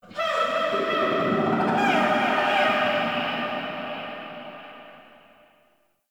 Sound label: Growly Overtone, Ambience; Warframe; Shadowgrapher